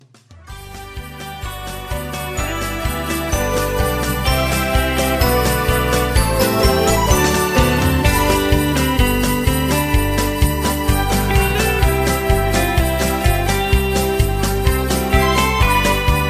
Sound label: Instrumental